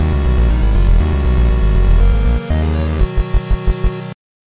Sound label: lose